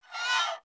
Pirate Adjust Hat Tip, foley

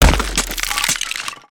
on death, creatures organic

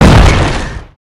rock spawn; creatures organic

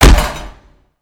Spider Get Hit

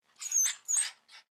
Pet Dog Small Idle, creatures; creatures pets; Lego

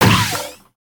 On Hit, creatures mechanical; Inventor Broombot

